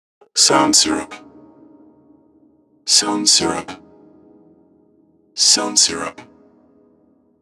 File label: VOX